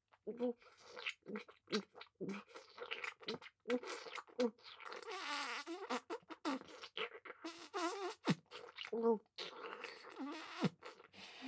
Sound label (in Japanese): フェラ バキューム